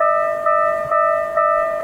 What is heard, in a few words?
crossing; tetsuon